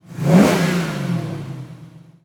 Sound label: Transportation Car 31 Ford High Boy Rev Single Rev